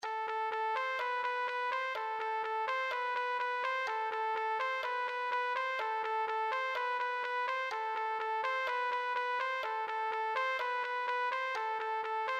trumpet

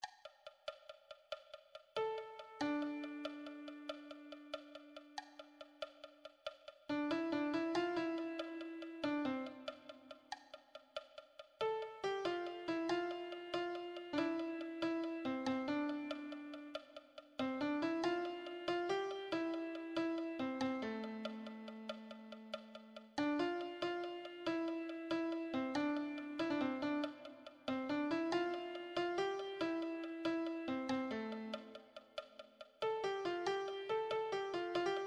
lead, piano

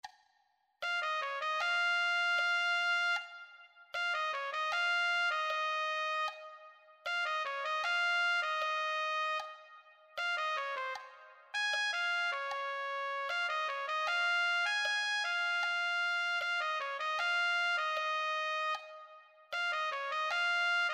trumpet

